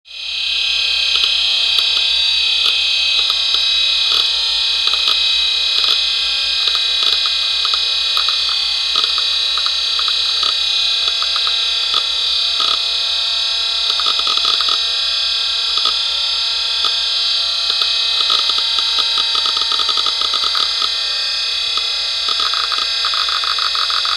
Computer; Loud Hard Drive Whir And Clicks.

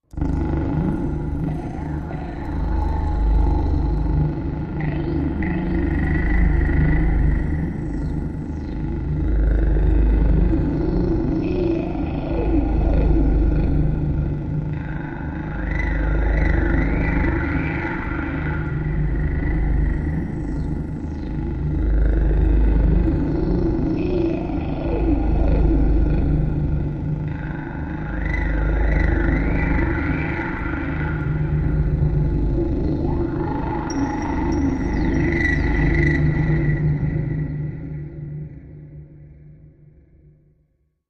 Belly Despair Pulsatin, sci-fi ambience

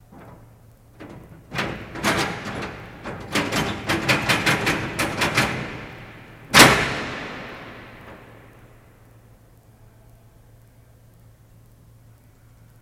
Door Latch Hvy Duty; latches & bolts

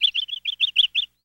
Canary Call Chirp

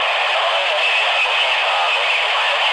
RADIO COMMS GARBLE, static

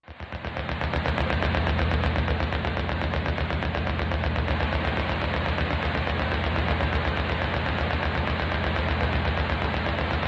Diesel Wash Machine En, winches; machines

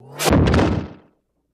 Rock falling ( cartoony ); rocks

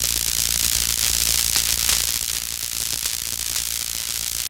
Elect Arc Generic, buzz & hums

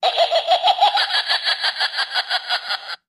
Evil Toy Laugh

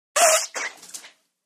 Eleph Trunk Squirt, water

